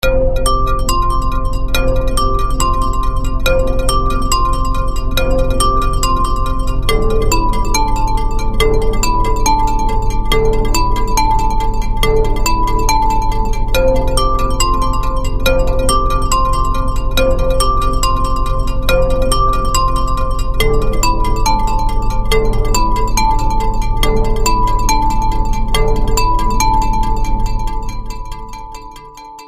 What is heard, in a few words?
music